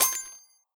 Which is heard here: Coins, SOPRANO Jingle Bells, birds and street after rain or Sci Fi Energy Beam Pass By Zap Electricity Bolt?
Coins